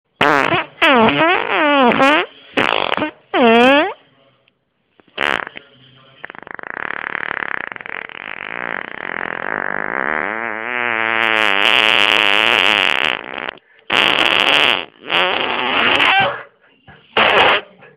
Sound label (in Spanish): Pedo cena